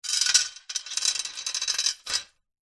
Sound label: industrial chain on metal movement